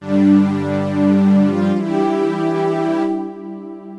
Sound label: Synt; FUNKIN&GROOVIN'